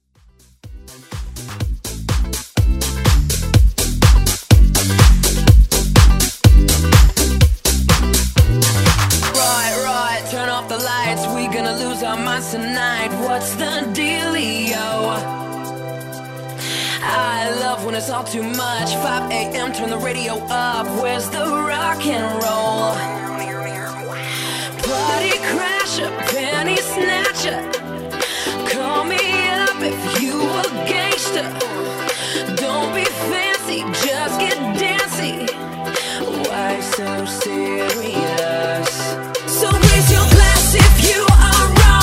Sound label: House Mix